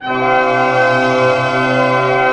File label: PENTA F# PP, orch penta; Sound On Sound; Peter Siedleczek's Orchestra